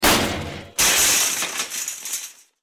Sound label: tunneler emerge; sporecarrier; npc